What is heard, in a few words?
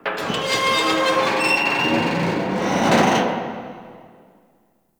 Metal Subway Car Heavy Creak; Warframe; Ambience; NineteenNinetyNine